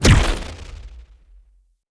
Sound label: Staff Proj Impact Player; Weapons; Enemy; Staves; Mnd Wazard Staff